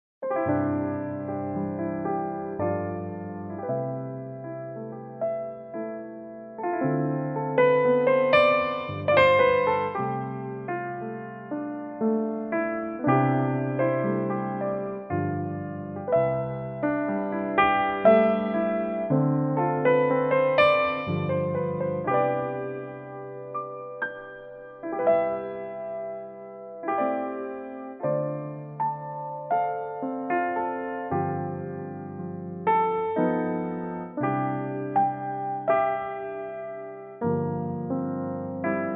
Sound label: C piano